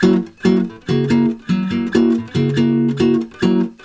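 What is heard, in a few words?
F# 7 guitar, Brazil Bossa; USB Soundscan; AKAI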